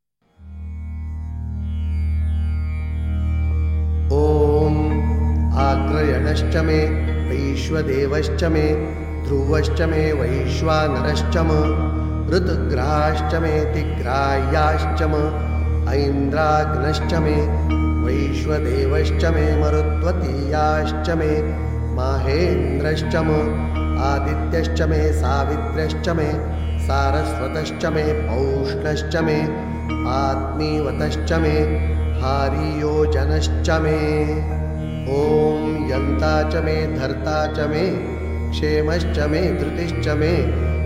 Shri Maharudra Mantra

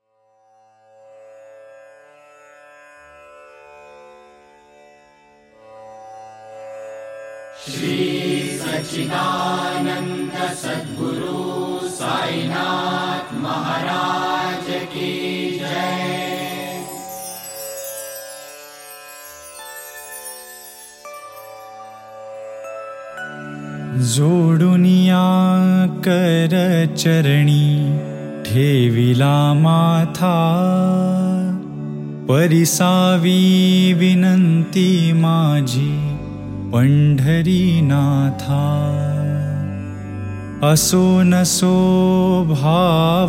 Kakad Aarti

